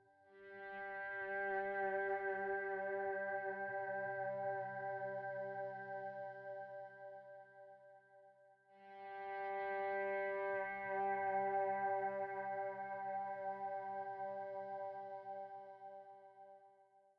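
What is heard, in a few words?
Country Guitar, Verse 1+2; Digidesign; Pro Tools Essential Loops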